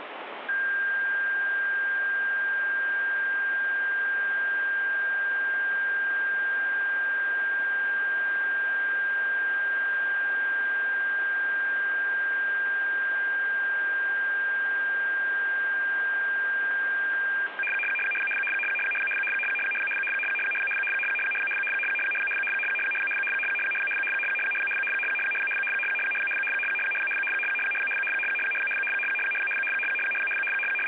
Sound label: US-INTELLIGENCE FSK-SYSTEM 100 Bd 800 Hz; UHF-SATCOM ONLY